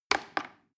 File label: plastic button little click regular slow